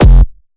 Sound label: Bass F, Bass Synth